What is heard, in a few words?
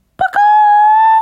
chicken; denden mushi